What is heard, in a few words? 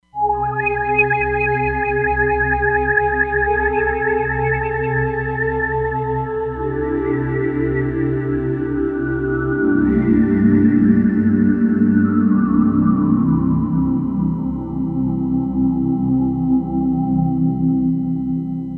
Atmospherics, Roland Fantom XR